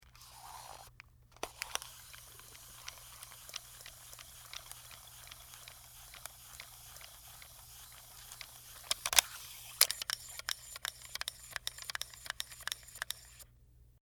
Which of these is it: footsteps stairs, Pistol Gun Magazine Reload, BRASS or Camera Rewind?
Camera Rewind